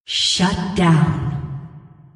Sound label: Shut Down